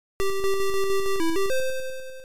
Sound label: Victory; Sos Music; Amstrad Cent Pour Cent